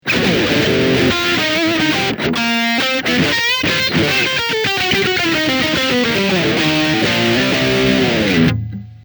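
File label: Bridge Channel 1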